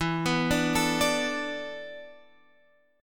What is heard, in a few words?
A Suspended 2nd Suspended 4th E